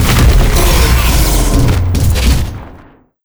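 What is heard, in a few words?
boss spawn, monster; Bizen; steamer crazy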